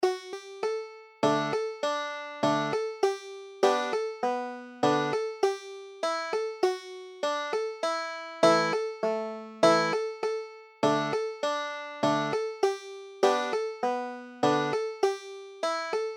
cider, banjo

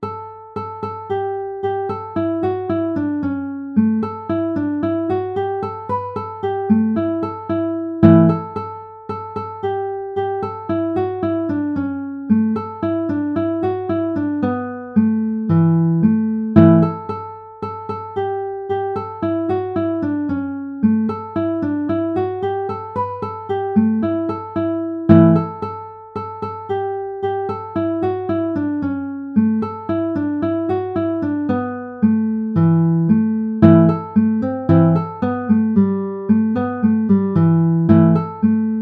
banjo

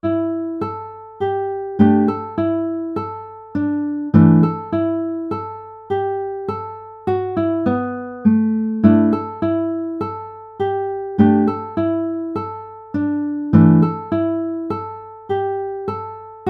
banjo

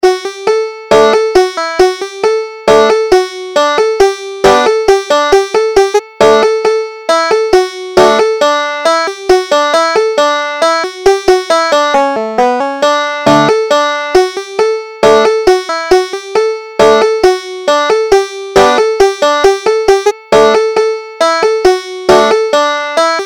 rock the cradle joe, banjo

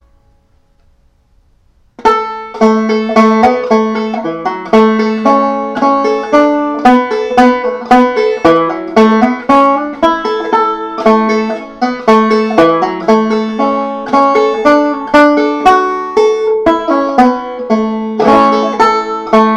banjo

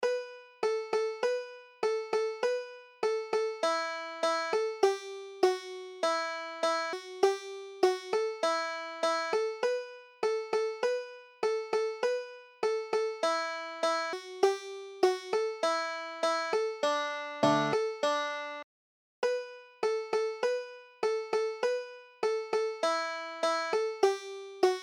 waiting for nancy, banjo

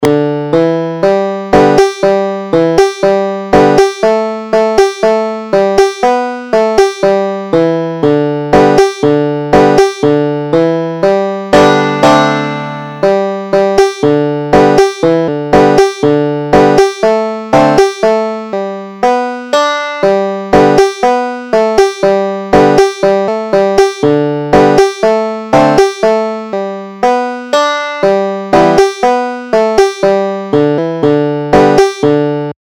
down to the river to pray, banjo

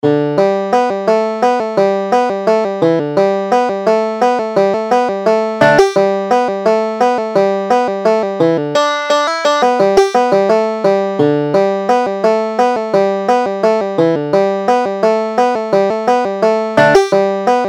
corn huskers, banjo

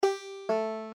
banjo

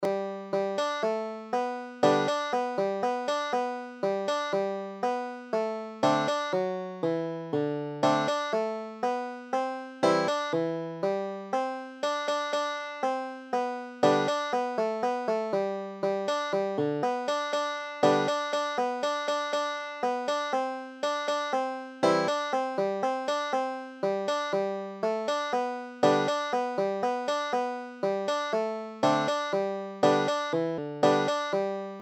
banks of the ohio, banjo

